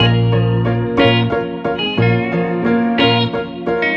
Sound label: guitar stingers